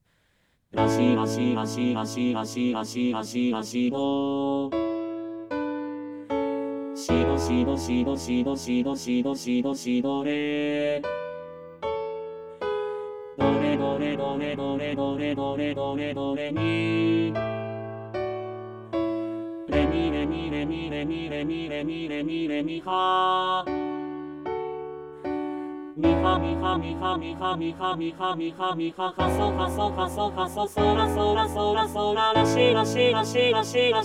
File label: Trill